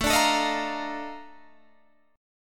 A sharp Major 11th A

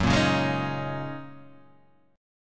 F sharp 6th Add 9th F sharp Guitar Half Step Down

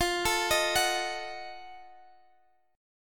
F 7th Flat 9th F down; F7b9; arpeggiated

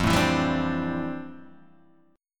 F Major 13th Guitar Open D

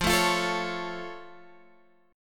F Augmented F Guitar Drop D

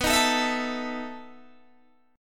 B 11th B Guitar DADGAD, chord

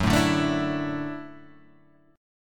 A Suspended 4th Gb, chord; Asus4/Gb strummed; JGuitar

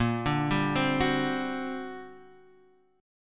G Minor Major 11th, A sharp

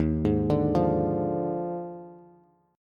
Eb Major 7th Suspended 2nd Suspended 4th Eb Bass; arpeggiated; 11,11,12,10; EbM7sus2sus4

